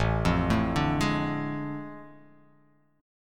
A Major 7th Suspended 4th Sharp 5th

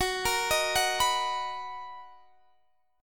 B Major 7th F sharp, chord

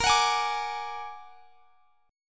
A sharp Augmented 7th A sharp, Chord; strummed

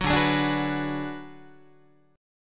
D 6th Flat 5th D down Guitar, chord; D6b5 strummed; JGuitar

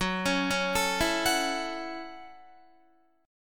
Gb Minor 7th, Guitar; Gbm7; arpeggiated; 13,16,13,14,16,14

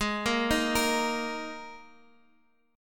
Ab Suspended 2nd chord, Guitar; Absus2; arpeggiated; chordshape; 15,13,15,15,x,x

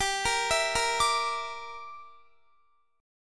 G Minor 6th, Guitar; Gm6 arpeggiated; x,22,20,21,22,21

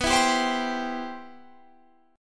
C Major B

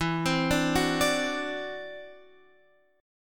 D 7th E, guitar; D7/E; arpeggiated

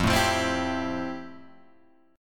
F Major 9th F, Guitar, Open G

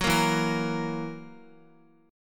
E Suspended 2nd Flat 5th E down Guitar; Esus2b5 strummed

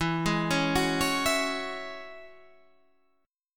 E 6th Add 9th E down; E6add9; arpeggiated

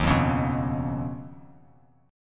Eb 7th Suspended 2nd Sharp 5th Eb, Bass Standard; 11,8,11,4; Eb7sus2#5 strummed; JGuitar

